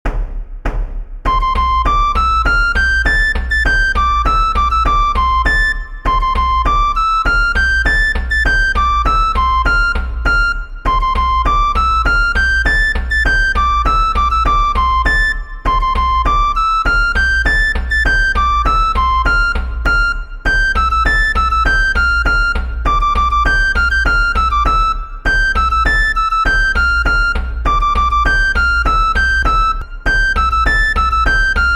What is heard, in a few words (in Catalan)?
polca; festa